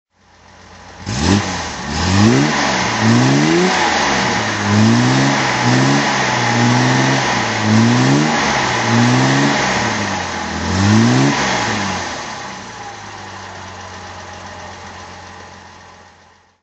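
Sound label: jaguar mkx throttle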